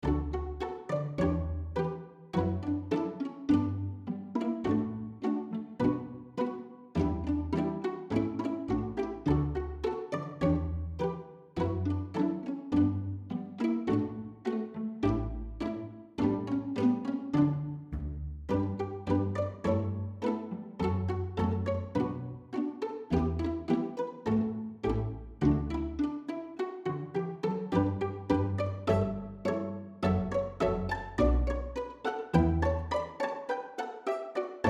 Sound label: Feeling Plucky, string